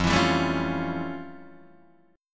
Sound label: F Augmented Major 9th, Guitar, Open D